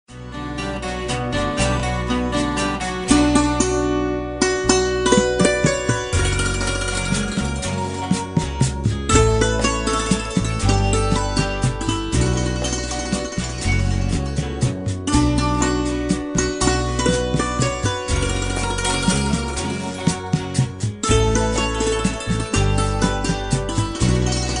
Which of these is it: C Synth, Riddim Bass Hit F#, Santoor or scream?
Santoor